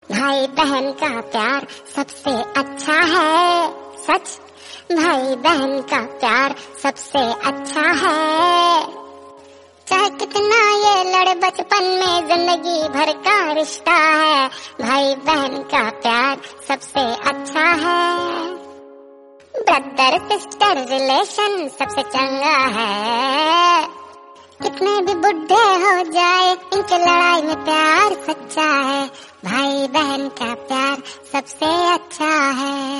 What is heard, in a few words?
bhai behan ka pyaar rakshabandhan song hindi song